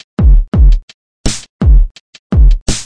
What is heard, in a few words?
084 superlow hoppin blast, drum loops